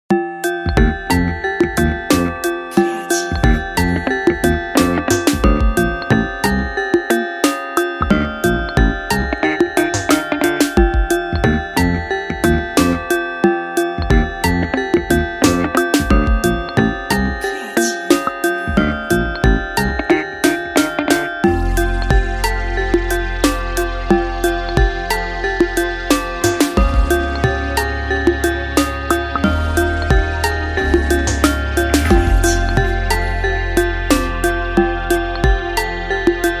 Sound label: Dancing Bells synthpop